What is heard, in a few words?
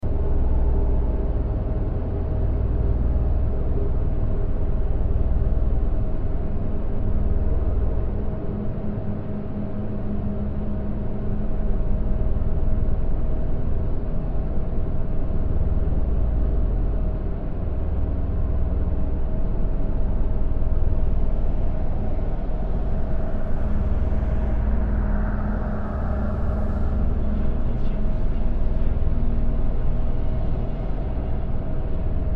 Hotel Ambience; escape the backrooms; Level 5